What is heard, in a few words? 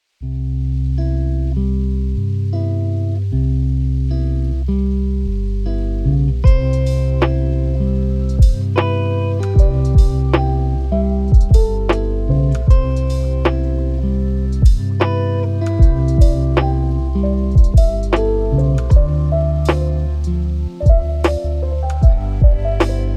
melting snow growing leaves and the sound of spring, lofi low fidelity